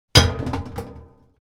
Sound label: Impact of glass bottle in dumpster